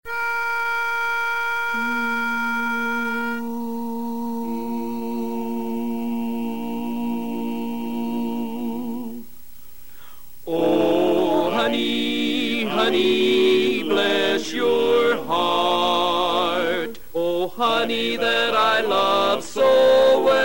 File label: Lead